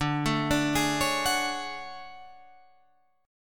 D Major Sharp 11th D Guitar Drop D

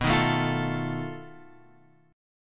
B 7th Flat 5th B Guitar Open G

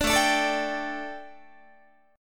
A 7th Suspended 4th, A, down, Guitar; A7sus4 strummed

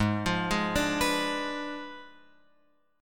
Ab Diminished 7th Ab, Guitar, Open F; Abdim7 arpeggiated; JGuitar

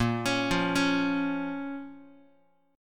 Bb Minor, Guitar; x,6,4,3,5,x; arpeggiated; 4A,4E,5A,5D,5G#,6C#; chord shape

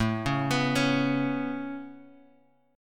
A Major Add 9th A Guitar Open G, chord

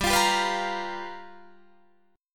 G 7th Sharp 9th G chord, Guitar Open D

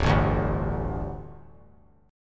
D Suspended 4th D; strummed

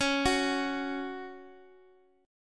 Gb 5th Db, guitar

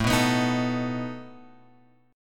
A Suspended 2nd Flat 5th A, chord; Asus2b5; {5 2 X 4 4 5} strummed

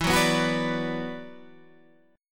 E 7th Suspended 2nd E down Guitar Standard; strummed; chord; JGuitar

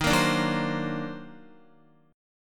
Eb Minor Major 13th, Guitar; EbmM13; strummed; JGuitar

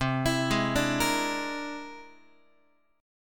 C Augmented 9th, Guitar; arpeggiated; JGuitar

